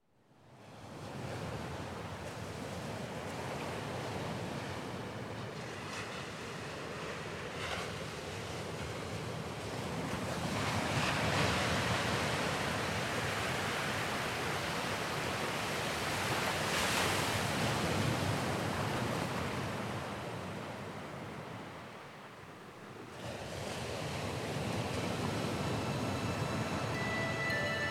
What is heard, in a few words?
Pilot whales, Laughing gull; звуки природи